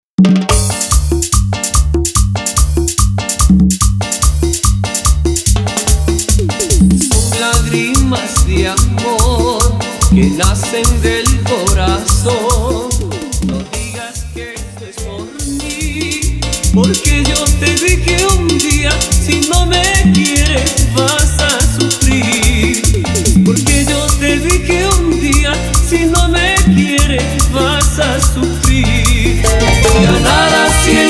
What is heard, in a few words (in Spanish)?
Intro Acapela, 145 Bpm